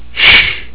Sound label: shh